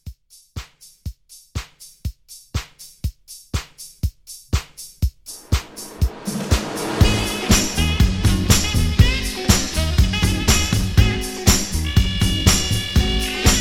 60s Soul Re Drum 121

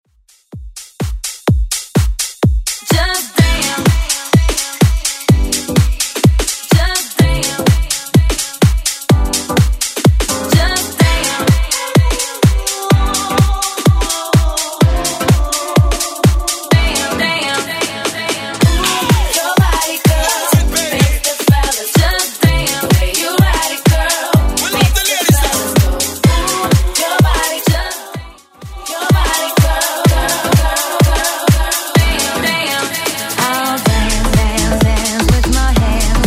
bootleg hype 126 bpm

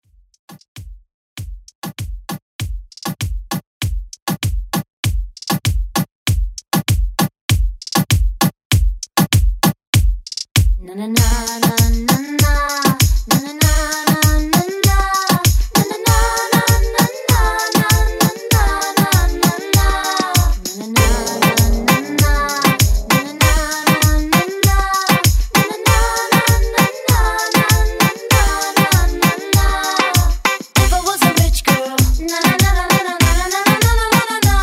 Mix 98Bpm

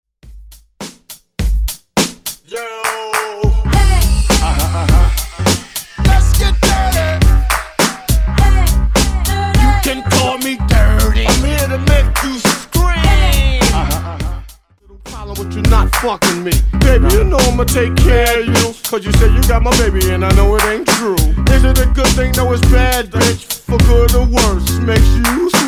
Funk Wordplay 103Bpm

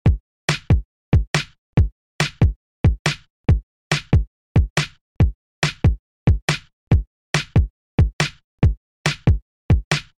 Dj Intro Outro 140Bpm

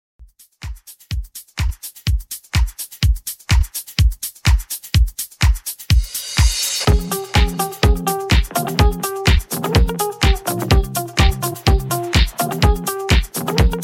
In Outro Edit 125Bpm Clean; djyan

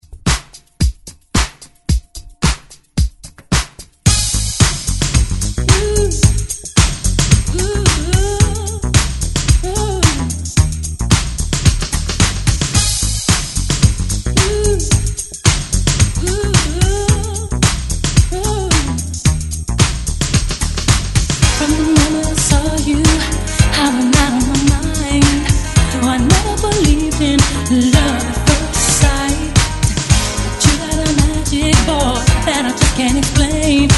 90s Re Drum 110 Bpm

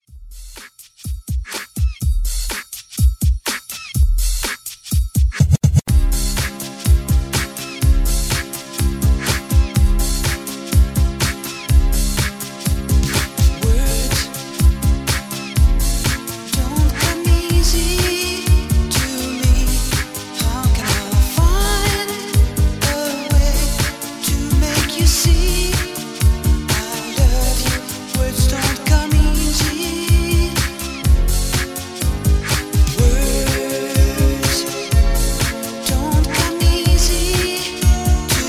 80s Pop Redrum; Remixfreakz

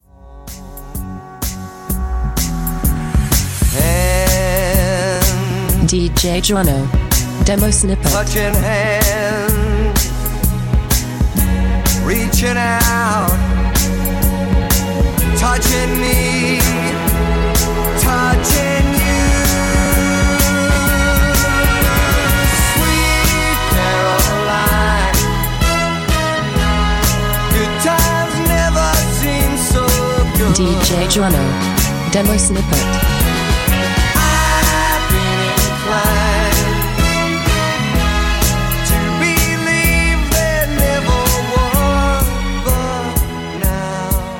126 bpm Abm; REDRUM

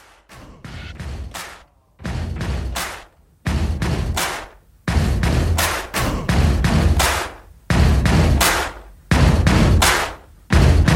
Mashup Re Drum

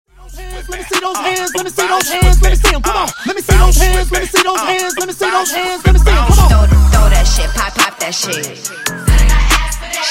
Hype Dj Intro Outro 70Bpm

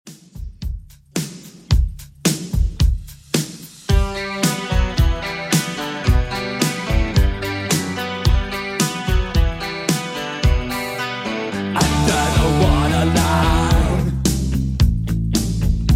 80s Redrum 110bpm

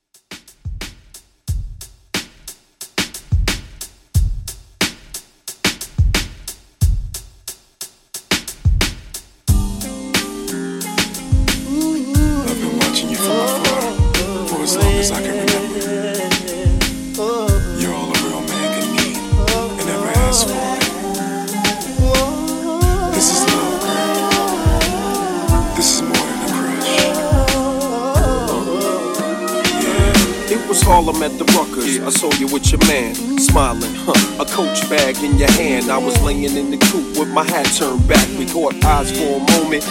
Old School Redrum 90Bpm

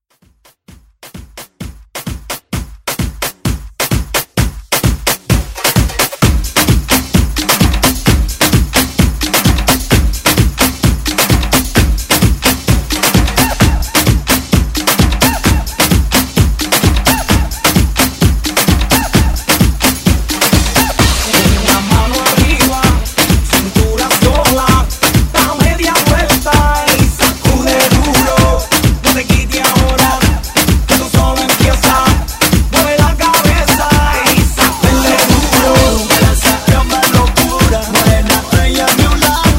Quick Flip

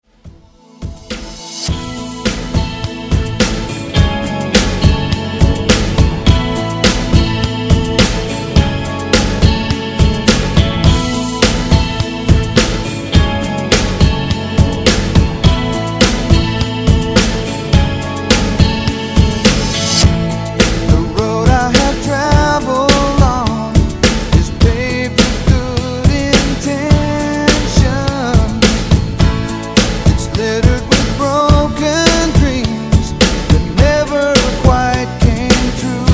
80s Ext Redrum

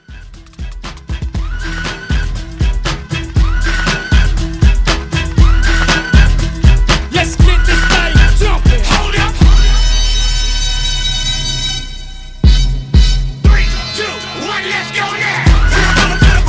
Old School Blend Re Drum 119

